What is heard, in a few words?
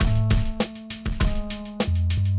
beat